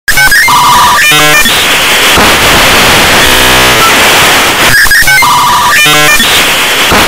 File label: internet connecting